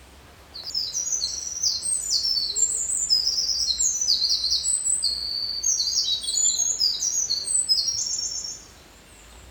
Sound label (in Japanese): misosazai